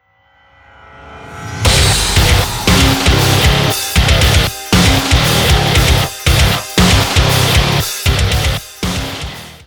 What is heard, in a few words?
BGM THUMB MENU; Busou Shinki Armored Princess Battle Conductor; arcade